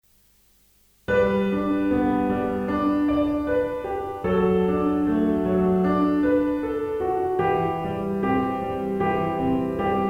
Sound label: piano pop